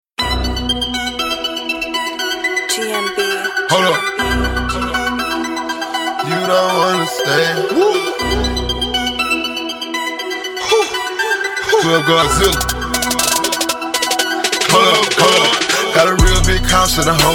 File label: Instrumental